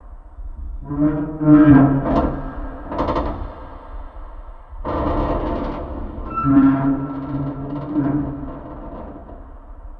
Ship Creak, ambience spaceship

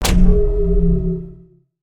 Whip Lash

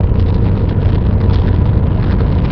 Rocket; foley

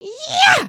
excited, emotes

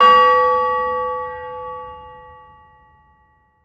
bells; destructibles

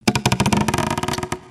Camera Shake; destructibles

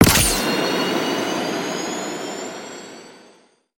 rocket build chunk

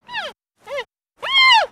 Pets Bear Panda Beg; creatures; Lego

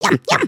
eat

